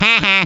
wah; tf; waluigi

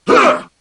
hit huh; superheros; ironman

